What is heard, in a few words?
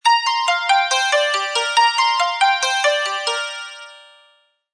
Echo Tone; Samsung SGH-i710